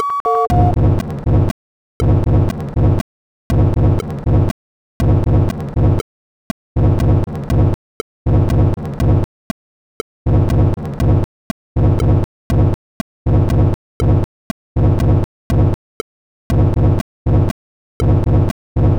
sinwave

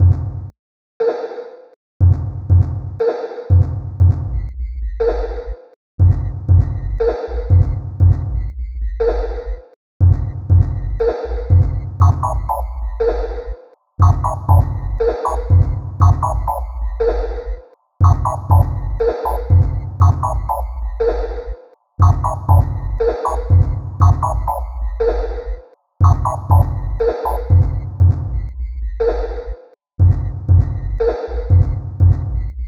Sine Wave

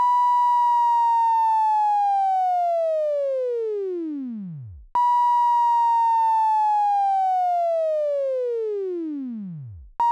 Sine wav

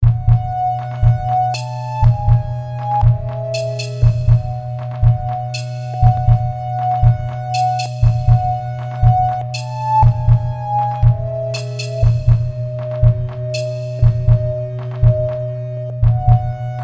Sine Wave

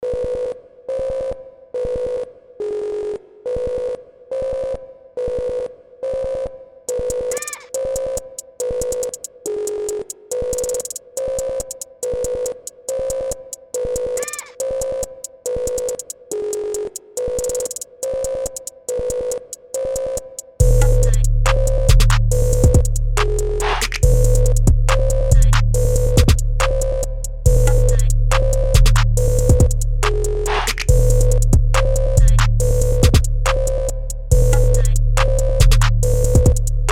Sinewave, SINGLE CYCLE 1K

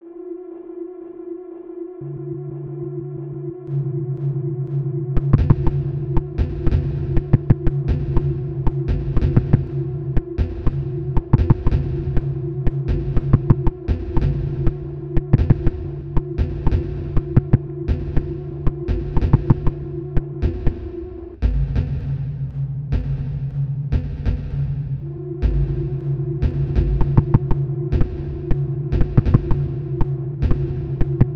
Sin Wave

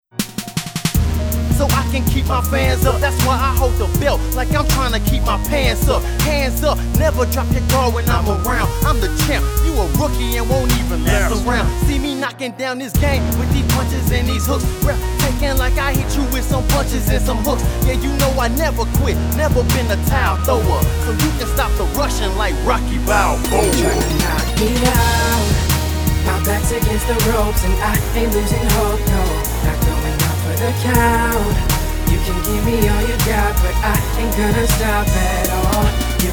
Hip Hop

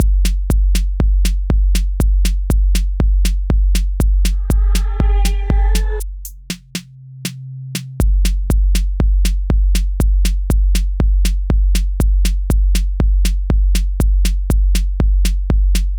fills and reverse reverb pitch riser

